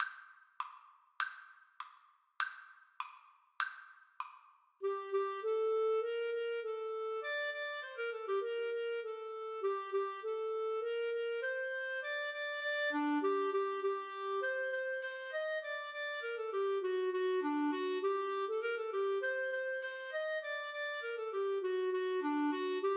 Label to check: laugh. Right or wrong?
Wrong